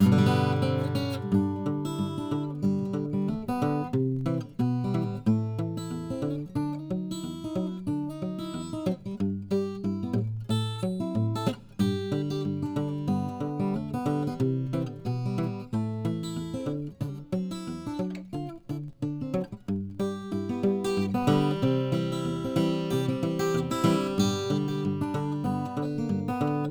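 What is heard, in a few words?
Auditorium OM Mahogany; Fleur-de-Lis; James E Wood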